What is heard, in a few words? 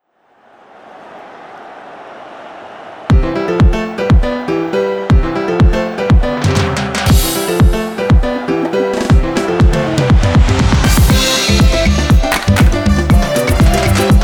karaoke